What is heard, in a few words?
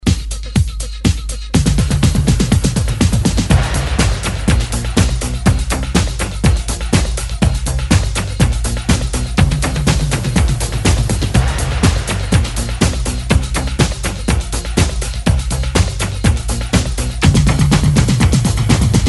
70s ReDrum 122Bpm; DJDOLLS